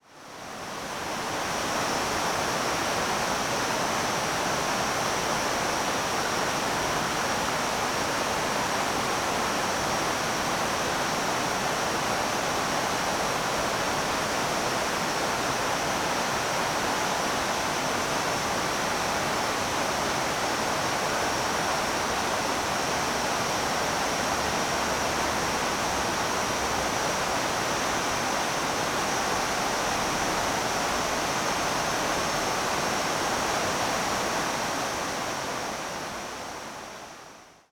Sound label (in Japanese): PCM-D50 taki; ic-recorder; field